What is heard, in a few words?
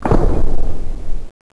big stop